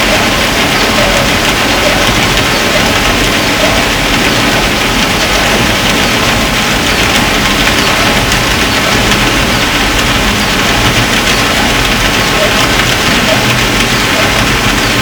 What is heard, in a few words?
Hydrophones, orcasound